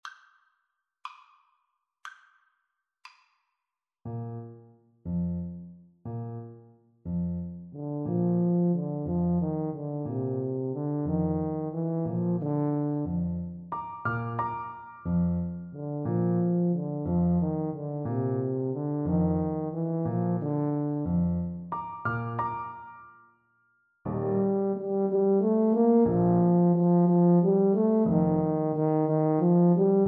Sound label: Tuba Duet